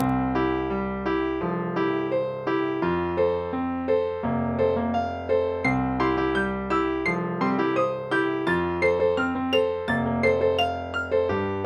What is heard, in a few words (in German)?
glocke